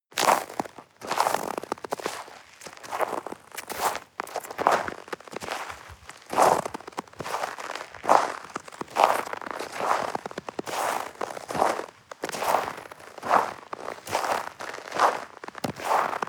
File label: step snow walk